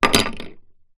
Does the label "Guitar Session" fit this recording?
No